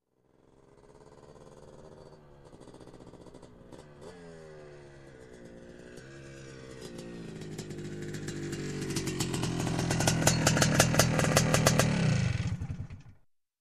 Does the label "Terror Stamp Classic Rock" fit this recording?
No